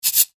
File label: shaker